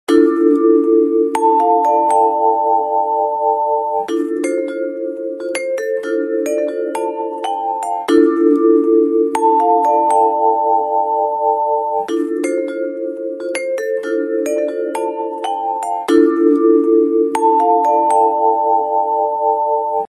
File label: samsung s9 just viber